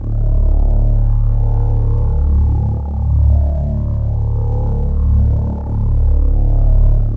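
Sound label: repulsor Pallet hum loop; machines mechanical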